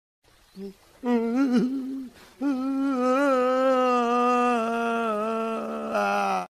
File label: crying; rajpal yadav; audio meme